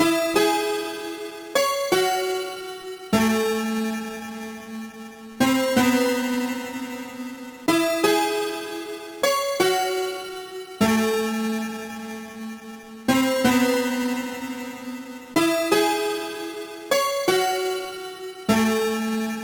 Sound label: piano bell